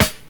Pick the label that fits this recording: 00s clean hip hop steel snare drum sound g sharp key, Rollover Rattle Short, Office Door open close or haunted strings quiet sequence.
00s clean hip hop steel snare drum sound g sharp key